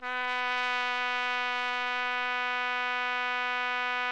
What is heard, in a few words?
TRUMPET; Best Service; Killer Horns